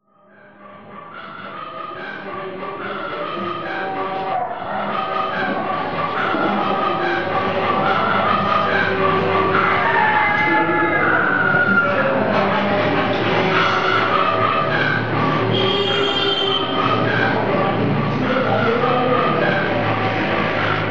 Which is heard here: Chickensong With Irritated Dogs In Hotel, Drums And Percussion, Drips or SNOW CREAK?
Chickensong With Irritated Dogs In Hotel